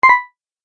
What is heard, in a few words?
Tap Select, button; multimedia sound effects